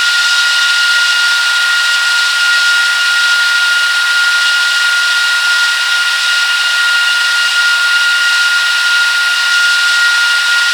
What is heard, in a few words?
Aircrafts Engines Mig Front Engine; DCS